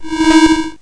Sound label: teleport